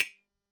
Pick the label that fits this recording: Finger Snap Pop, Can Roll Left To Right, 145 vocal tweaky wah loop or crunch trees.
Finger Snap Pop